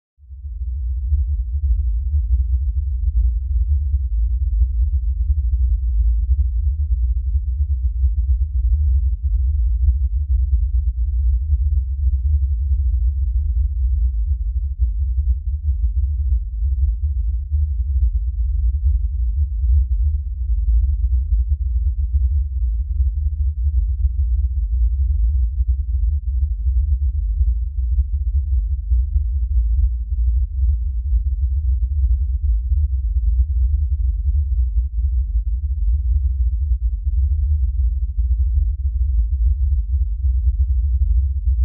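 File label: Electric Drone Heavy LFE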